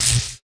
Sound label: effect thunder